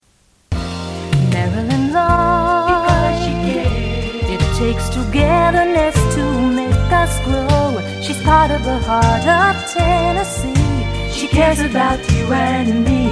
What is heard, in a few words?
political jingle